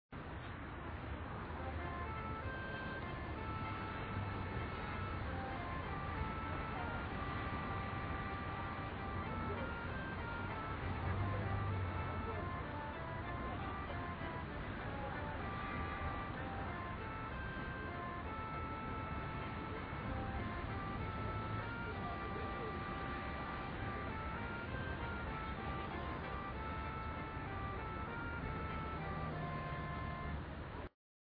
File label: ambience location